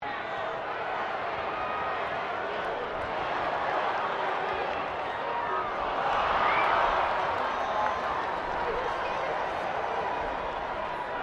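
crowd 15K Rugby World Cup ambience medium loop, Rugby world cup game; Crowd Sound Effects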